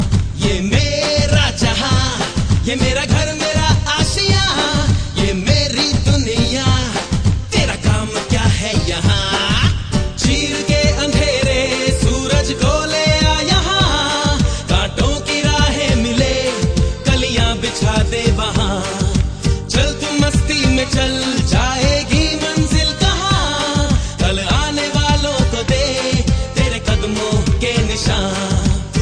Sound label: kushi telugu